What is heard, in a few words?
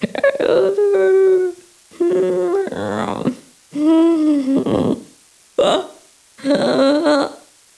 Siren Ambient; Carol